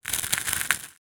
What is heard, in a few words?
salt dispenser turn grind; zapsplat